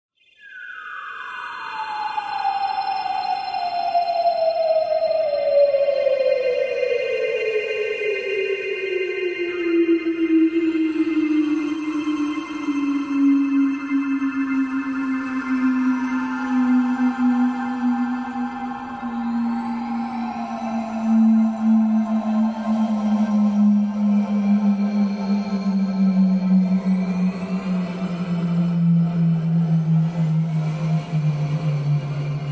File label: Resonance Sweep Descending